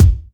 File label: Kick